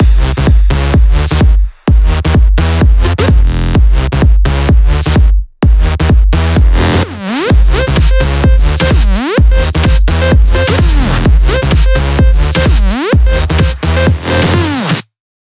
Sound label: 128BPM Mix; Vengeance Samples; VFH1 128BPM Southern Kit